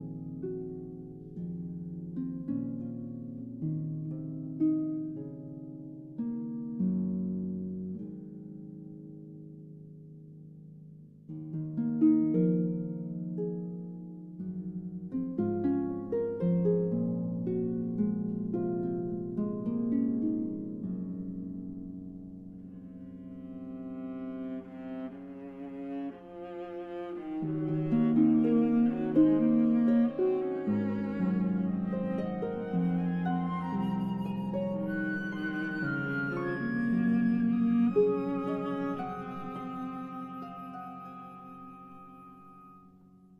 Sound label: Harp Concerto Lullaby